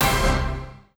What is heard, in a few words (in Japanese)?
den